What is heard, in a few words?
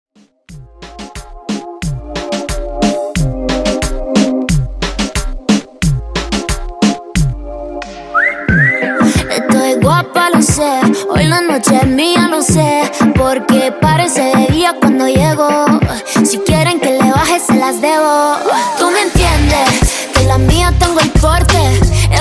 in outro reggaeton 90 bpm